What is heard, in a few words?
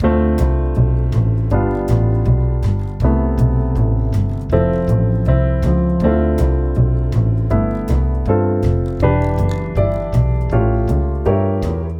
jazz